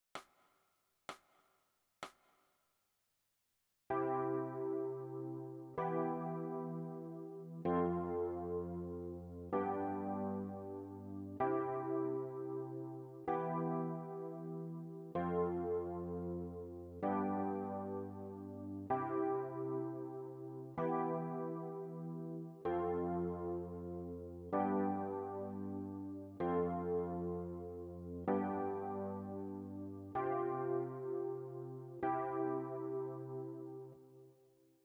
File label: E Piano